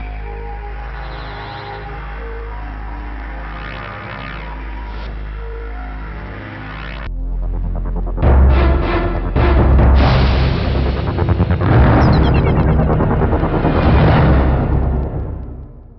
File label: splash intro; Zombie Studios